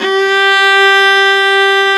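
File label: G3, strings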